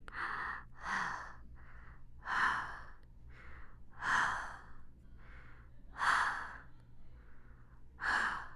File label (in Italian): Respiro